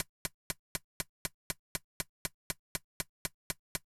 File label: TR909 Closed HH; hydrogen